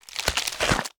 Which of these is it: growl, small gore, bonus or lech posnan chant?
small gore